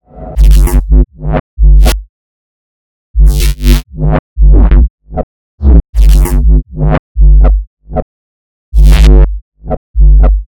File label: fun with layers, Neuro Bass; GranularSumo Neuro Bass Pack 01